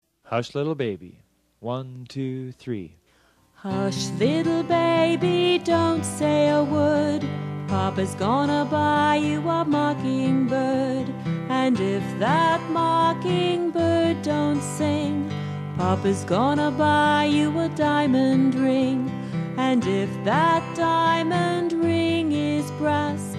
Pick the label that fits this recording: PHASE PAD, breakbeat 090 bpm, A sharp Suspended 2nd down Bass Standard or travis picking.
travis picking